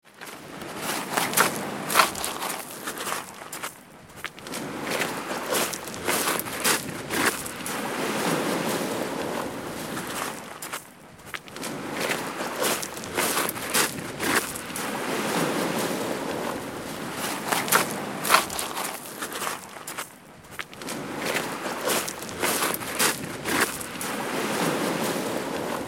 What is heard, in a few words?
Footsteps on the beach wet sand medium pace